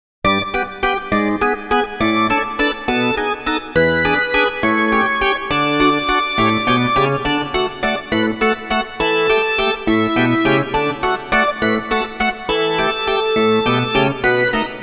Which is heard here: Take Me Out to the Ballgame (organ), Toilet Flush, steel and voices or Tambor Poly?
Take Me Out to the Ballgame (organ)